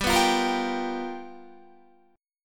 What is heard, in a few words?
G 7th Sharp 9th G, Guitar; G7#9 strummed; JGuitar